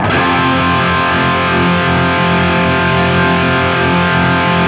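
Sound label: power F loop, guitar power chord loop; East West; Steve Stevens Guitar Samples Collection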